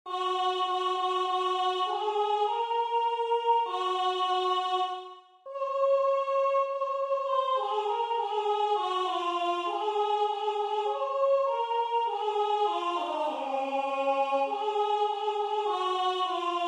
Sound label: rhythm